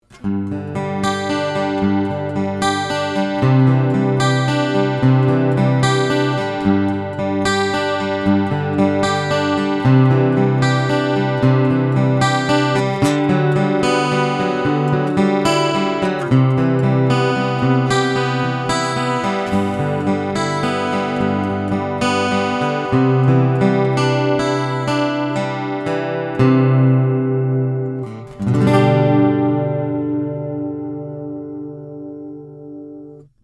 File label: Zoom A3 chorus